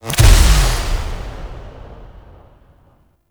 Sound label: Alt Impact; Warframe; Tenno; Grimoire